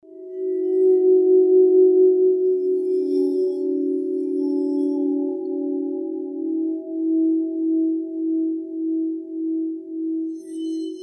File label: Bowls Only, himalayan singing bowls